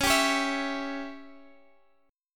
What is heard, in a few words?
C sharp Diminished C sharp, Guitar Standard; strummed; chord; x,x,11,12,14,12; JGuitar